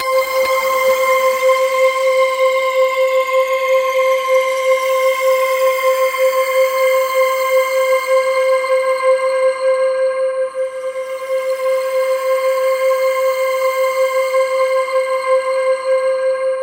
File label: C5, Synth Line; 90 s Sample CDs; NiceBeats; SynthLine VOL-11